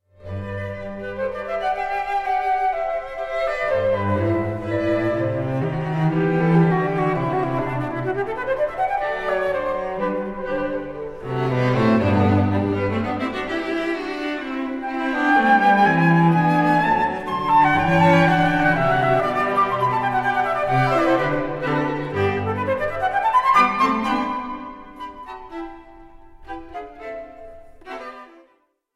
quartet in G major K285a for flute and strings 3rd mvt tempo di; wolfgang amadeus mozart; menuetto